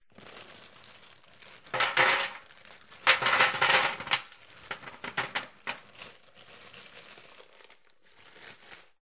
household kitchen frozen chips baking tray tin metal